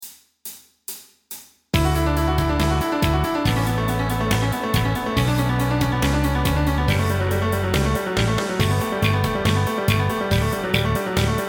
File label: Backing Track